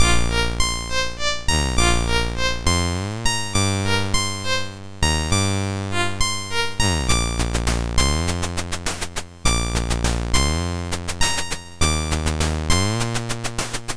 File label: sid MOS 6581R2